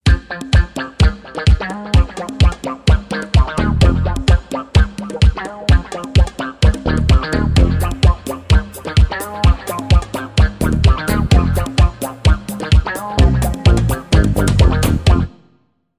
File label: ringtone disco, instrumental